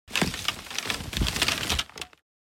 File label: Dropping golf bag on grass club rattles